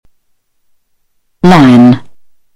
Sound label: lion